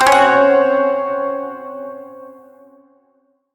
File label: Shawzin Hirajoshi Chord L; Warframe